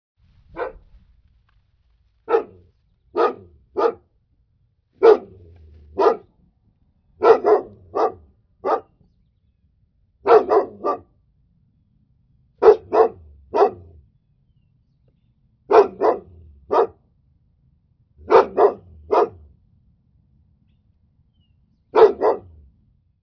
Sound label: Dog bark sound; dogs